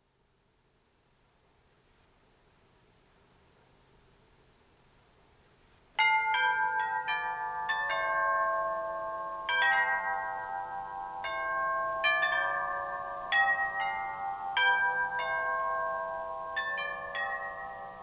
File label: Chime; Dr. Arthur B. Hunkins